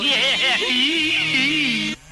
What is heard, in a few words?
weird laugh; gta2